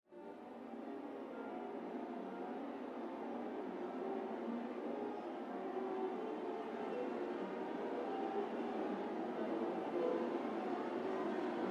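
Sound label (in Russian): orkestrovij uzhas skripki narastayushego uzhasa, orchestral horror rising violins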